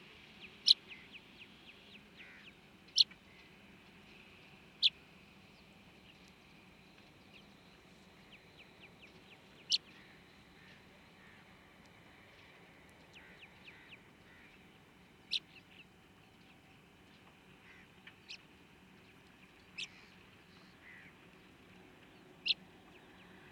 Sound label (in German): weissstirnlerche